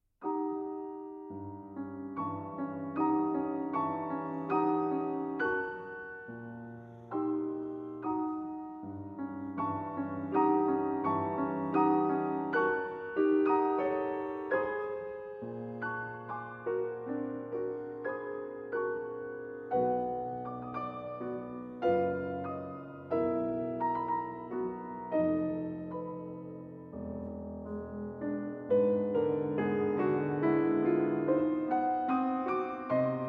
Piano Preludes No. 4 in E-Flat Major, Lento Moderato